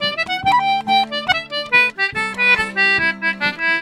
Celtic Flavours; USB Soundscan vol.42; AKAI

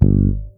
FRETMUTE G, PEDULLA BASS; Masterbits CLIMAX 7 - Bass

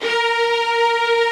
HI ST STRING

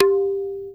TABLA OPEN, Indian perc; Spectrasonics - Heart of Asia CD2

